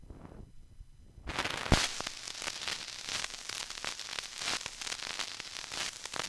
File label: vinyl crackle; 99Sounds; Vinyl Noise SFX